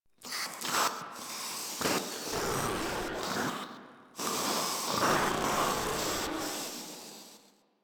Proto Infested idle; Warframe; InfestedNinetyNine

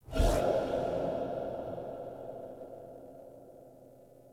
Toggle Shard List; DE; Warframe; Helminth Shards

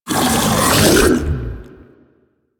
bowl devour; Warframe; Warframes; Grendel

